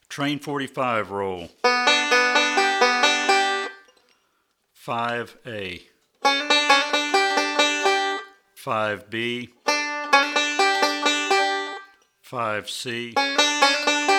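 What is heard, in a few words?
Train 45 Roll, banjo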